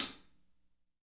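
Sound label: hat closed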